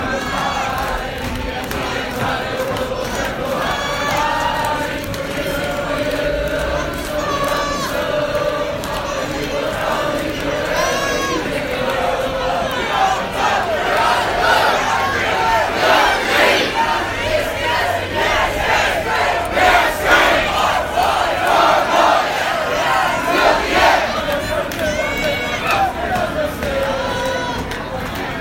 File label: nat sound, sounders fans converge on boeing field